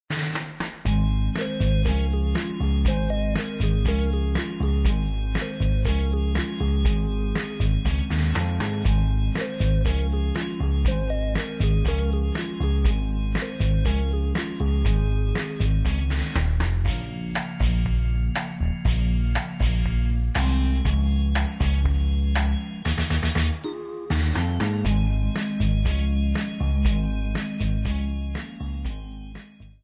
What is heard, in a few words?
groove